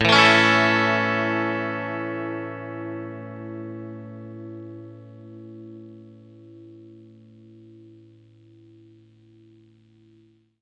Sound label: clean chord A, clean electric guitar chords